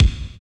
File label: KIK, Cheez Kiks; Club-50 - Foundations Roland